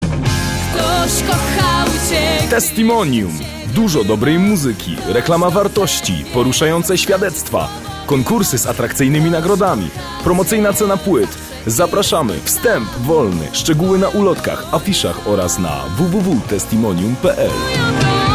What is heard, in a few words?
jingle; reklamy